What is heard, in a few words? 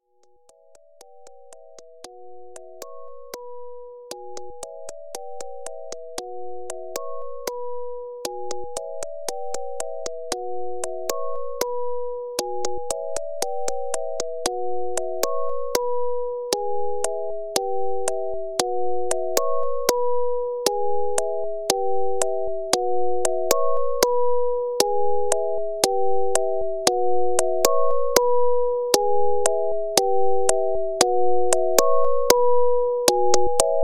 Chiptune